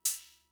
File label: Cymbal; Fricke MFB-522